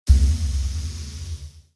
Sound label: laser